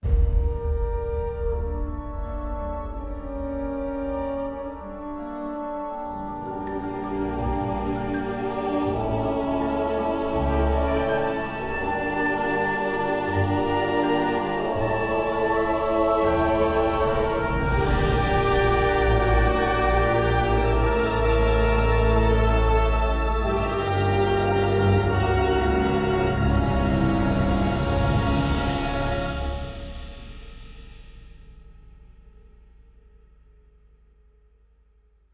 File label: castle music